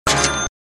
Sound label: cash